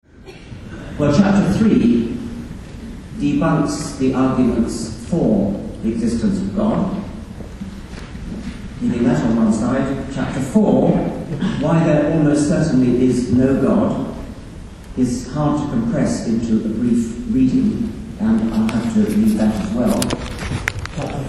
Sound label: Girl Crying